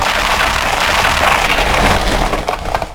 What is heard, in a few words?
new dirt